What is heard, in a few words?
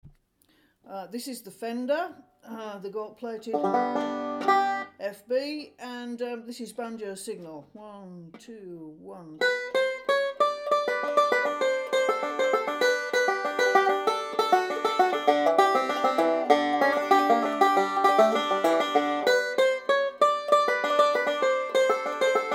Fender FB59 Banjo Signal